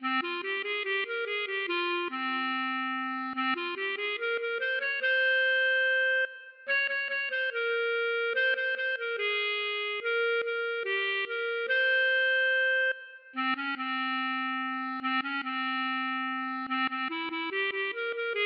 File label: klezmer